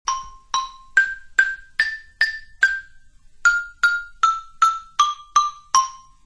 xylophone twinkle

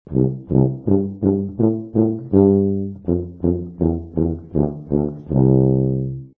tuba twinkle

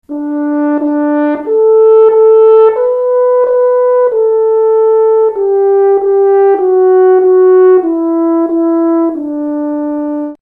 french horn twinkle

